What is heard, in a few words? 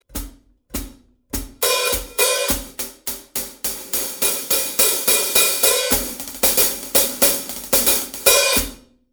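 reg hihat 14 performance, cymbal; sabian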